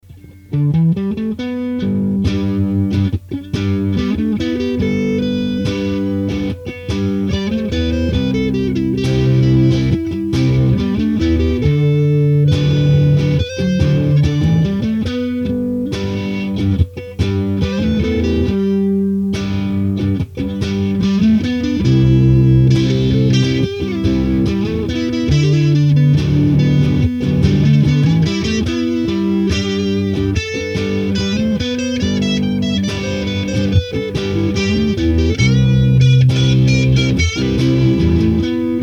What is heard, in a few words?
Preamp; circuit demos